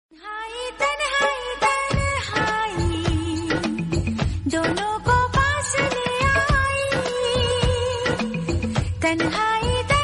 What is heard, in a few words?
bgm hindi; koyla; shah rukh khan